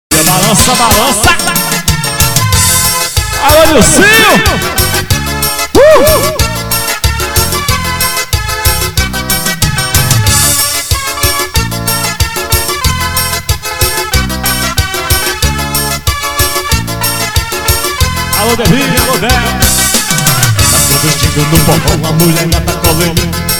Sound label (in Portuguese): forró